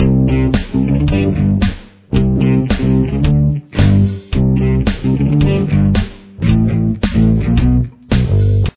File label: guitar